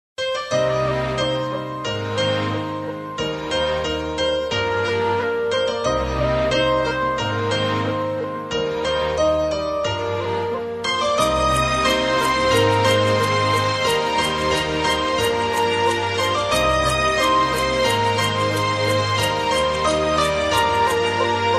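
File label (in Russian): krasivaya instrumentalnaya muzika, beautiful instrumental music; Красивая Инструментальная Музыка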